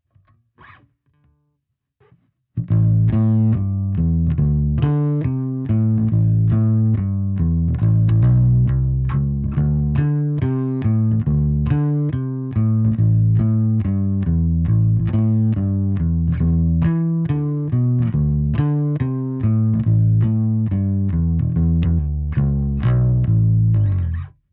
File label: SUNNY DRV BLUES Bass AMPS